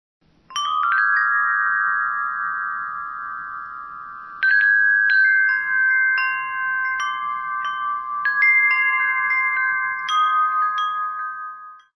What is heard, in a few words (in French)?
Carillon Grand; PROVENCE